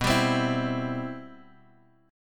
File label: C Major B